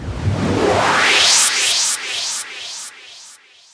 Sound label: FX Sweep, 128 BPM; Vengeance Samples; VTDS2 Song Kits